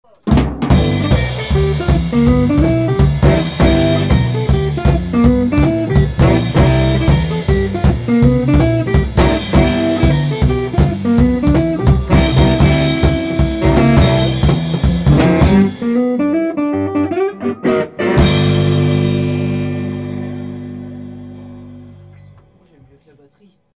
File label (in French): test fin avec batterie